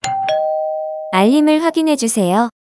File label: memo on, alarm